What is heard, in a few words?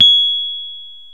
PNO, Rhodes Mk.V; Keyboards of The 60's and 70's